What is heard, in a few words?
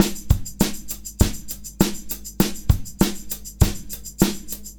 Combo, R&B Drums